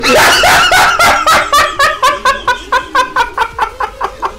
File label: hahahaha